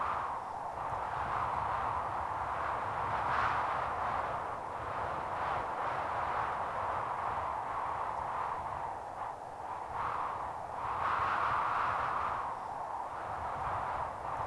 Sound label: wind; WAR